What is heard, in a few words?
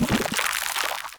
Sludge Damage Proc; Procs